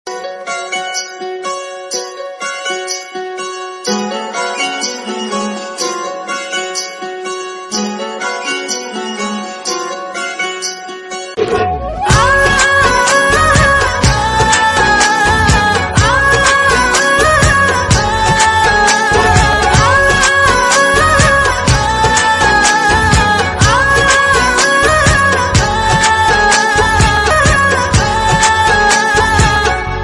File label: Instrumental Ringtone